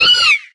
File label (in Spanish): Grito de Skitty